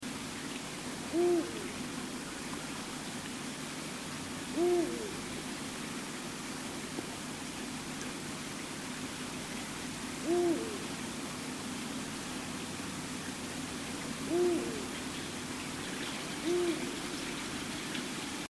Berguv; Eagle Owl; Bubo bubo; Patrik Åberg

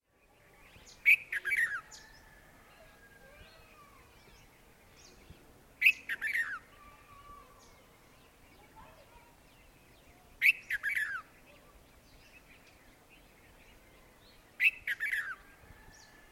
rodgumpad bulbyl; Oscar Campbell

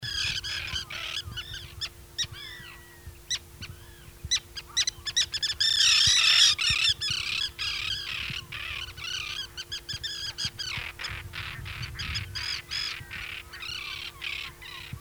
Fisktarna; Common Tern; Sterna hirundo; Niels Krabbe

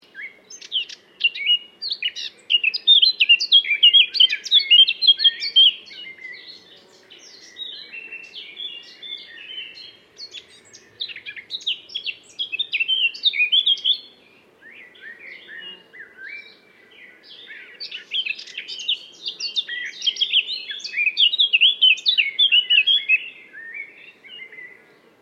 Svarthatta

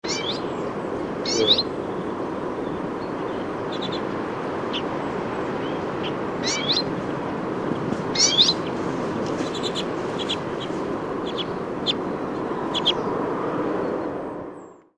Snosiska

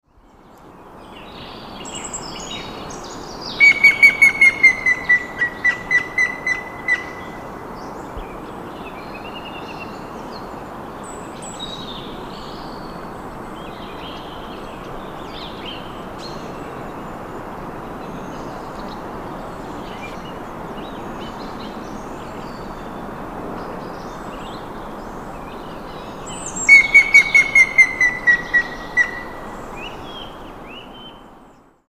Graspett; Grey-headed Woodpecker; Picus canus; Matthias Feuersenger

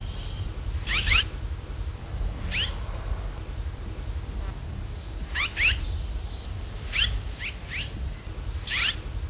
Rostgumpsvala

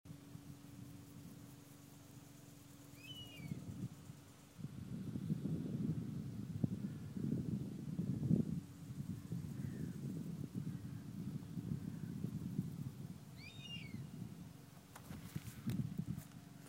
Bivrak; Honey-Buzzard; Pernis apivorus; Martin Rost

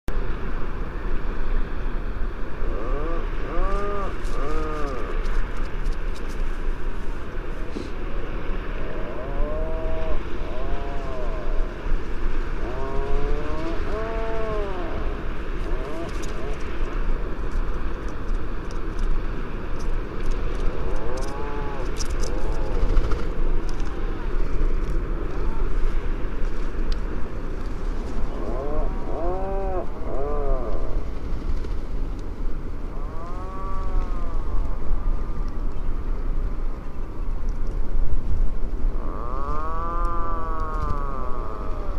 Lunnefagel

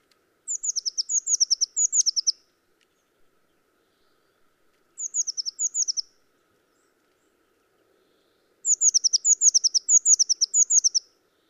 Blå mes; Blue Tit; Parus caeruleus; Patrik Åberg

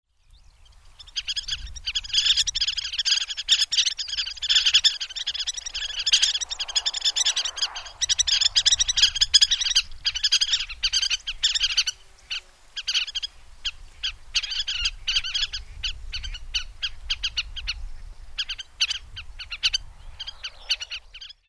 Rodvingad vadarsvala

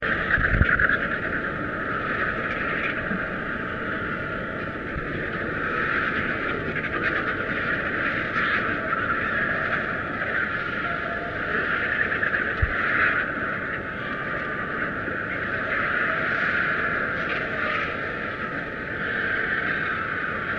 Gasgam; Griffon Vulture; Gyps fulvus; Juan Malo de Molina

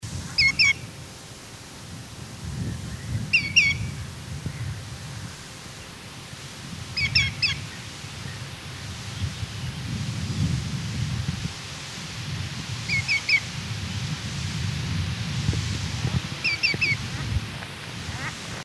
Gluttsnappa; Common Greenshank; Tringa nebularia; Niels Krabbe

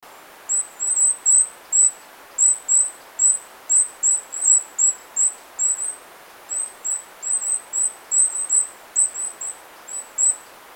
Kungsfagel

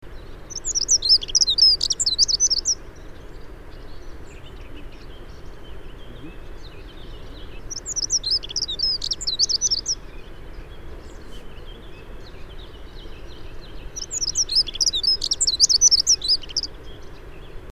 Jarnsparv; Prunella modularis; Dunnock; Grosselet Olivier

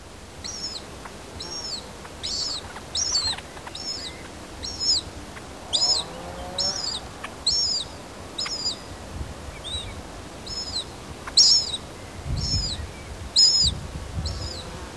Angshok

